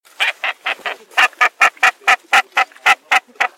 HAVSULE Morus bassana

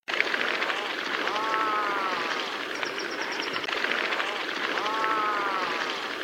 LUNDE HORNØYA

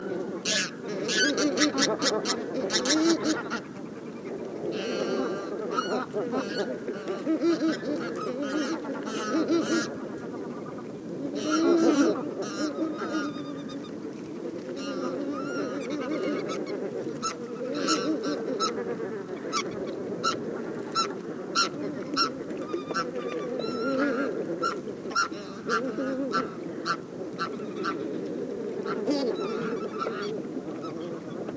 KORTNEBBGÅS flokk HOTELLNESET

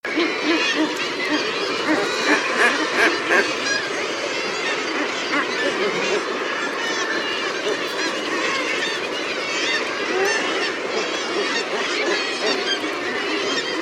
LOMVI krykkje HORNØYA

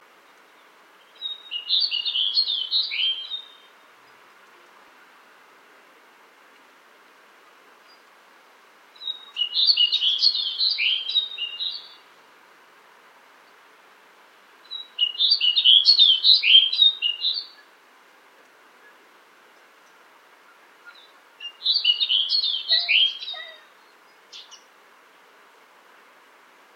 SNØSPURV PLECTROPHENAX NIVALIS sang

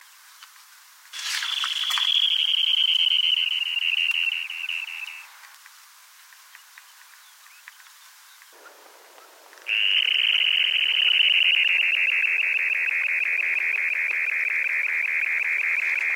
myrsnipe calidris alpina arctica

